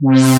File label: Synths Root C